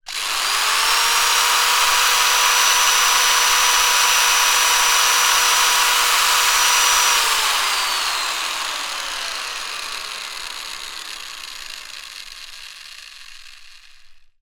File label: industrial tools drill electric med burst freewheeling; sound effects one